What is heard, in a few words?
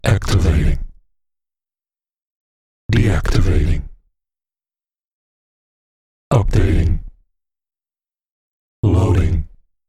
Activating loading